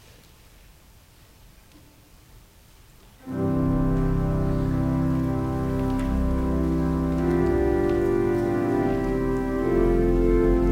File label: Organ Music; Netherlands Reformed Congregation, St. Catharines, ON